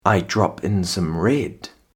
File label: drop